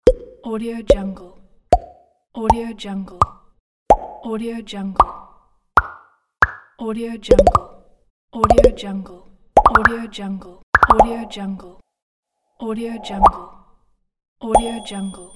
pops

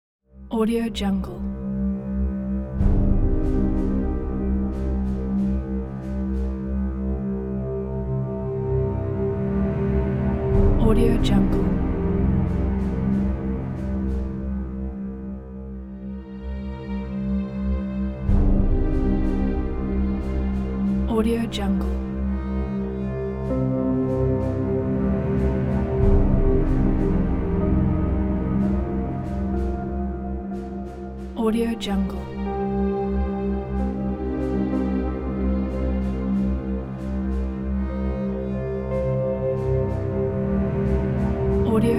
historical documentary

